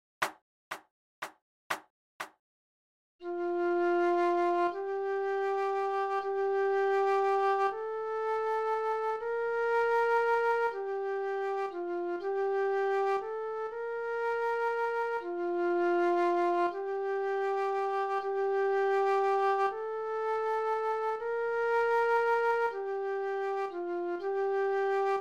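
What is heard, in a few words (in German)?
Floete, 40 bpm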